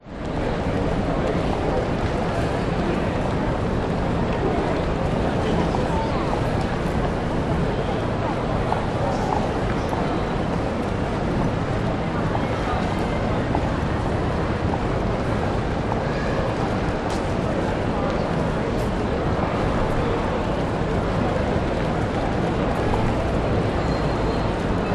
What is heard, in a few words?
brisbane cbd queen street mall people city all clock tower stikes 15 mins